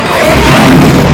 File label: XWING; XWNGSNDS; GRAVIS